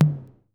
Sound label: KR55 CONGA L; Crypton - DrumBase5000; KR55B